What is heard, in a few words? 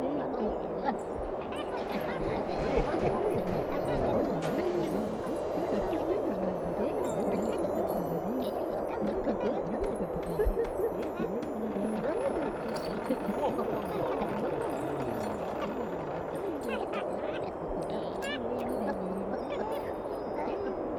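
Ambience Dojo Santas Workshop Loop; Fruit Ninja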